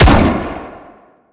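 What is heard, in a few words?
Sci weapon Shot Medium Gun Chamber Rattler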